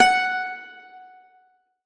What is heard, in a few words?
F sharp 4; mandolin